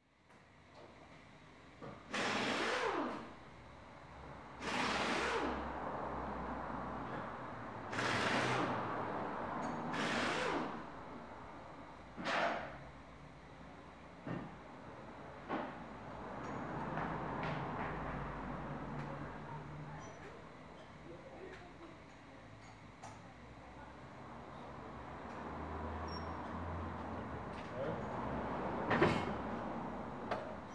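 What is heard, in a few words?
Car service, auto repair shop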